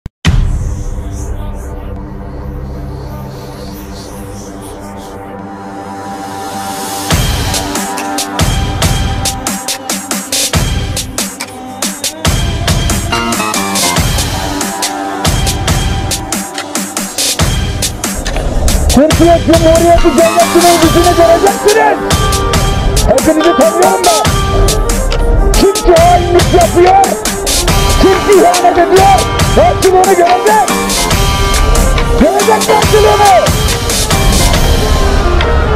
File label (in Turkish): sirenli muzigi; operasyon muzigi; destur